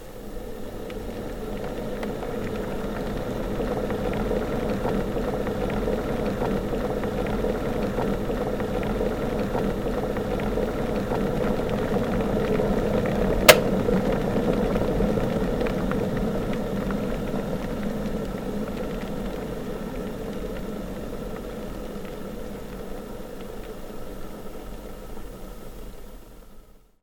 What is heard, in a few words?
water heater; cooking